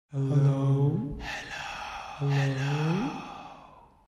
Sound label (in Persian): صدای ترسناک کلبه وحشت; cottage of horrors